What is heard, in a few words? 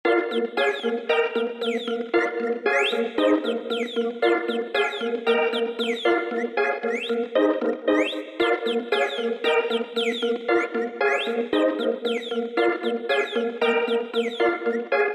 summit, nada dering iphone